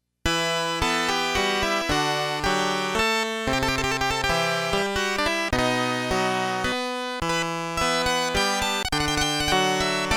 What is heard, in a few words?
Harpsichord